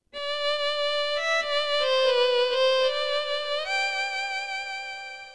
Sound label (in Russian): skripka